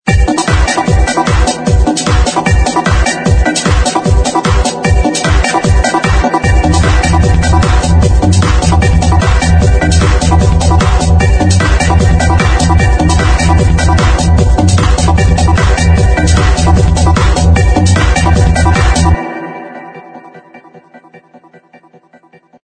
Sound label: Ringtone